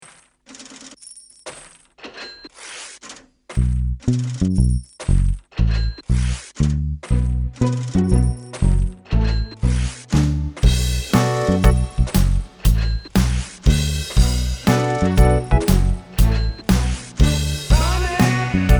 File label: no gtr